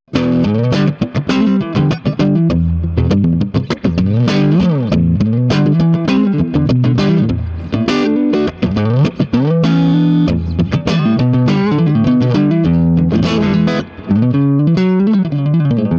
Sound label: Swart Amp